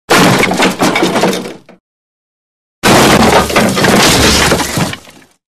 Wood Crashes With Debris Fall, Explosion Sweetener; Hollywood Edge Foley Sound Library